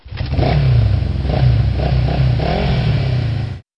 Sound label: motorbike